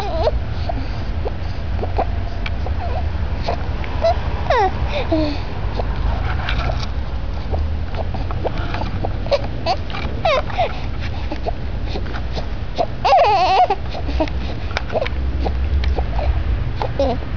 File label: Giggling